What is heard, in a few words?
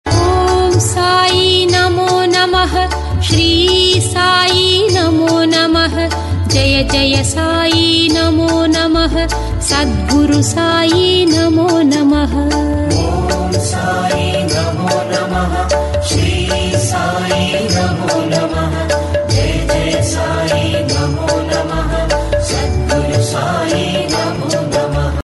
Om Sai Namo Namah; Samsung; SCH-W259